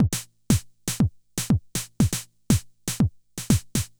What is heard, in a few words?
House Drum NoHH